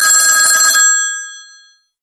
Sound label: Old Tele Ring